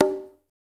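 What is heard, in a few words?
congas, right; Spectrasonics; Liquid Grooves 1 & 2; CONGAS+BGOS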